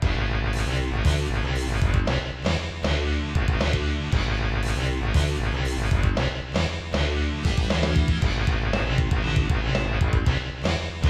Long Horn; Super Fire Pro Wrestling X; SNES

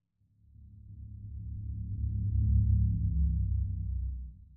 train boss defeated

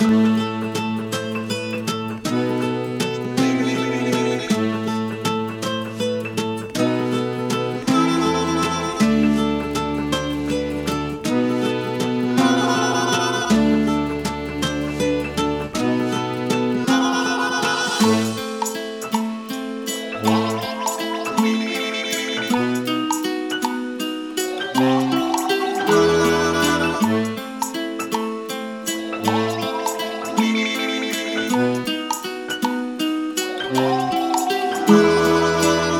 MX Swimming Normal; burly men at sea ios macos ps vita ps4 switch windows gamerip 2016

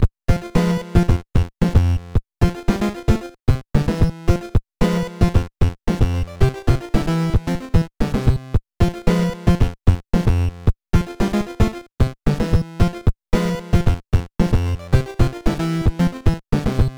Weapons Shop; Esper Dream 2; nes

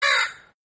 beast sound; Caravan Beast

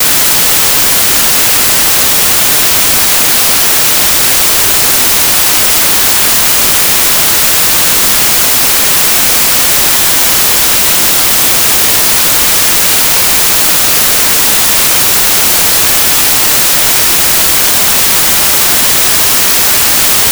Theme of Love Piano Solo; Chocobo's Dungeon 2; psx

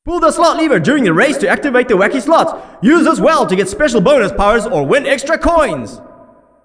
Slot inst; Wacky Races; Type X2; arcade

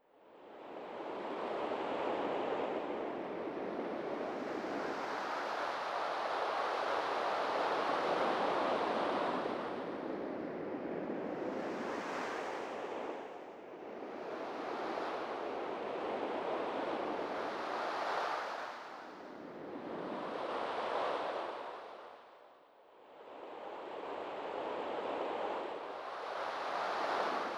Ambience; niconico

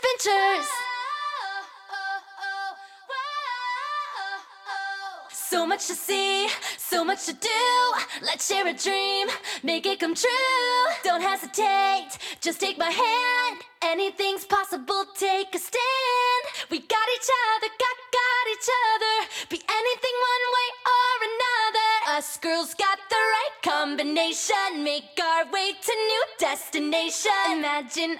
Band Lead Vox LOOP

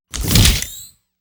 railgun equip; gamerip; Shattered Horizon

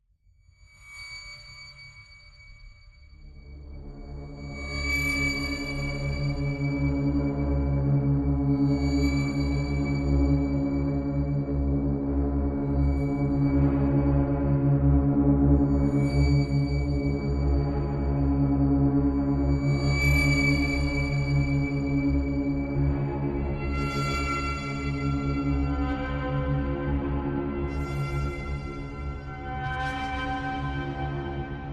Suspence Pad, Low Intensity; apex legends season 12

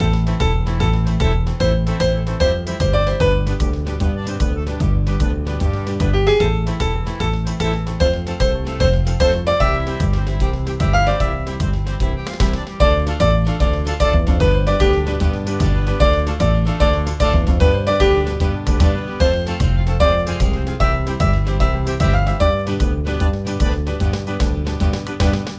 Monkeys Music; benjamin bluemchen ein tag im zoo; nds gamerip

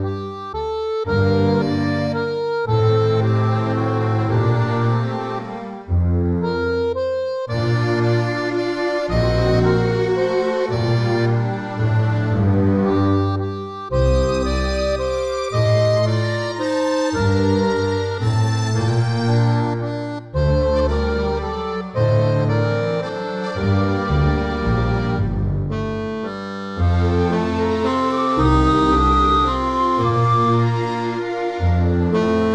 Defeat; Men of War: Red Tide

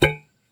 metal pot soft hit

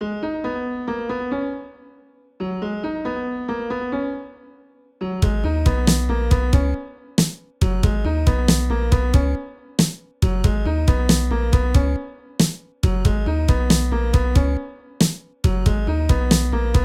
Ruins (Instrumental)

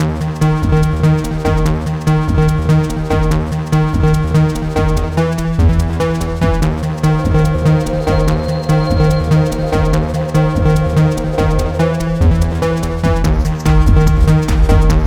145 ravey game loop; linux macos windows 2017; Mindcubes Inside the Twisted Gravity Puzzle; djgriffin

